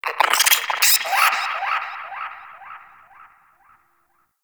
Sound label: Cryptilex Lure Call Success, Hunting Critters; Characters; Warframe